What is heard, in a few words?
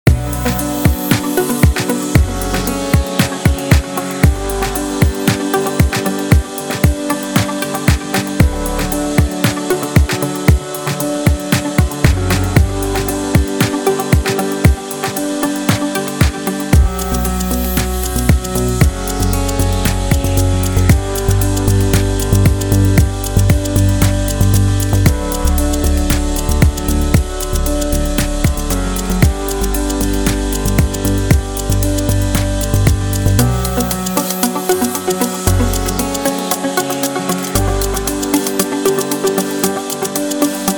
Berlin Keys